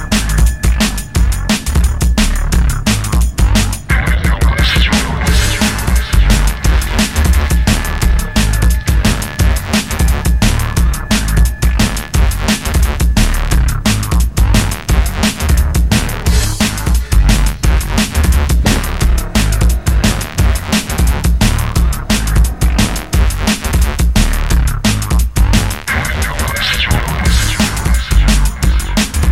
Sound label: Drum & Bass; Emcee Recordings